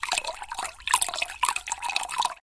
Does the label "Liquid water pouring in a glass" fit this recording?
Yes